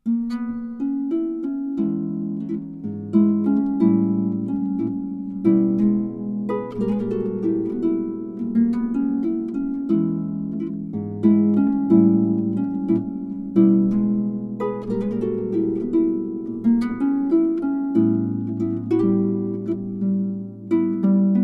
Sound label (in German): blues, Harfe